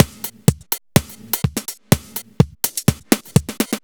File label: Drum Loops, 125 BPM, Underground UK House & Electro; Sonic Foundry (Sony Creative Software)